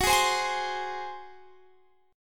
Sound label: F sharp Suspended 2nd Flat 5th, Guitar, Standard; strummed; JGuitar